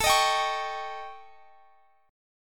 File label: A 7th Flat 5th; A7b5; strummed